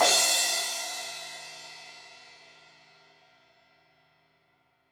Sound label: Crash 16in edge, Cymbals